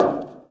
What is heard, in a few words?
bleacher step; Golden Crayon Sounds